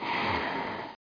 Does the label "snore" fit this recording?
Yes